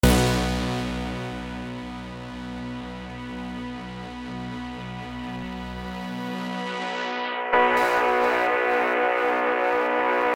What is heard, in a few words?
Melodic Tech Voices; Vengeance Sound Avenger 2; BA Odyssey Full Blown